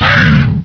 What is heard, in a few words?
RIP PAIN